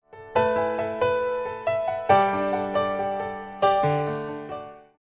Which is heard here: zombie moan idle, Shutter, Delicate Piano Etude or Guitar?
Delicate Piano Etude